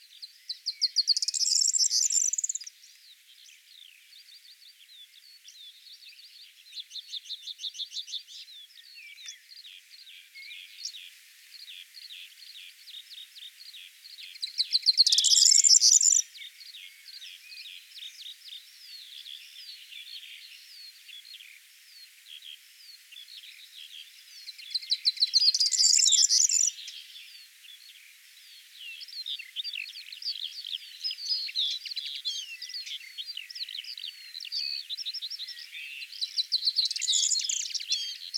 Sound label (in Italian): Strillozzo